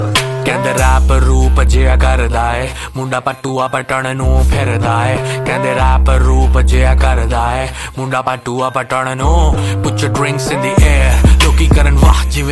Rap Jingle Bell Song Ringtone

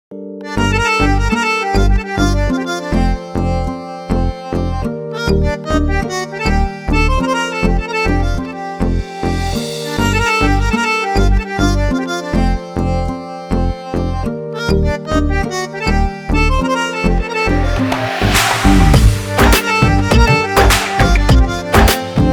Instrumental

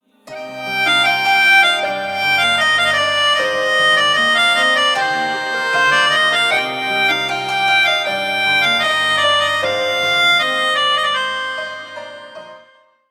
Instrumental

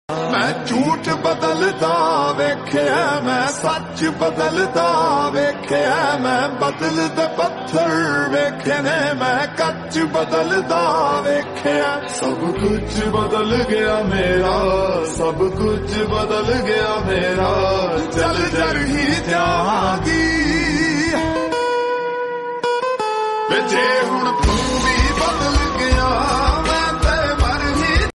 Lofi Version Punjabi Song

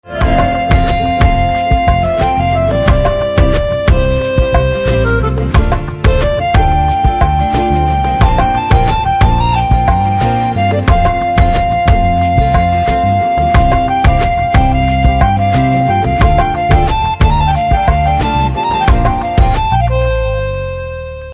BGM Ringtone

